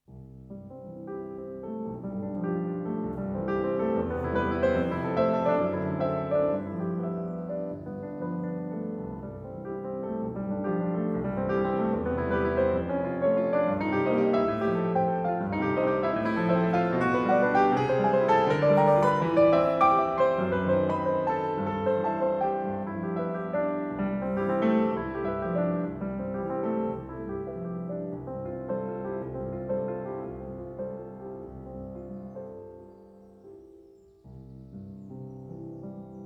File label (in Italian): Preludio in do maggiore, piano; Chopin - Piano Works - Vladimir Ashkenazy